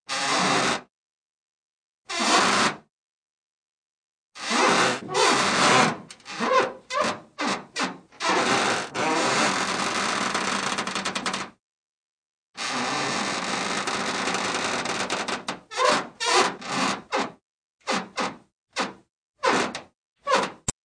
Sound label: Track, Doors; 헐리웃특수효과음; 문 소리